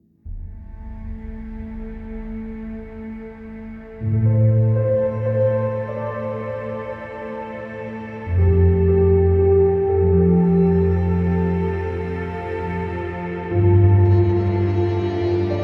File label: Dawn Piano; The Long Dark